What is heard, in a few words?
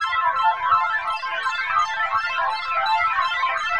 126 F SYNTH ARP; funky; Loopmasters; Funky Underground Vol 2